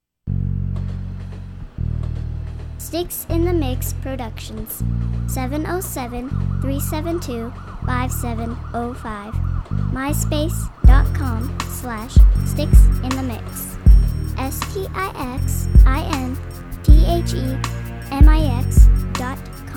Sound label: piano